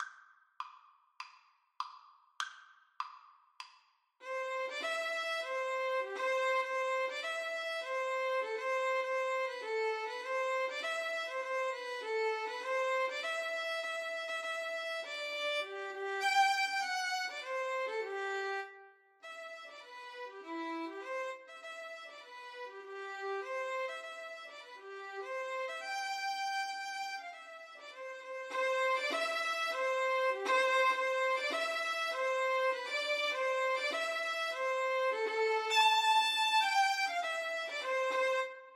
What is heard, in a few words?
violin duet